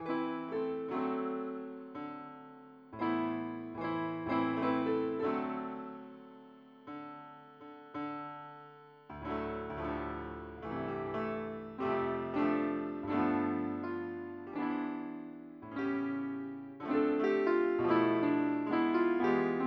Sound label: piano